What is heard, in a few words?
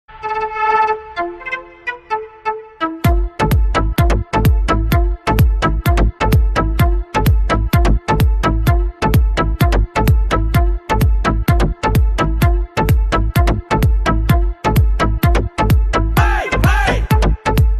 Hard Bass